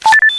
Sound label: Cardlock Open